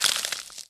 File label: scarab; Single Scarab